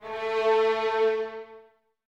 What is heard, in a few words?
Violins Detache A 3; Miroslav Vitous; String Ensembles